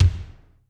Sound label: ROOM K, ROOM KITS; Crypton - DrumBase5000